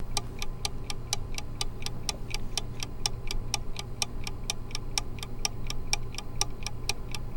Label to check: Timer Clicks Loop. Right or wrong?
Right